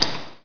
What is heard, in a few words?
drip; KATANA; Daikatana